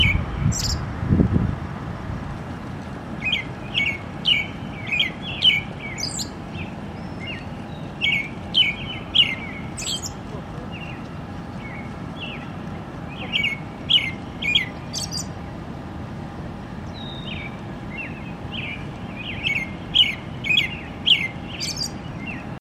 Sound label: american robin